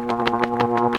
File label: WINGS